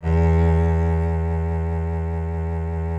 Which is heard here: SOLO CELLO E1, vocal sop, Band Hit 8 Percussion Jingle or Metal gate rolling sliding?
SOLO CELLO E1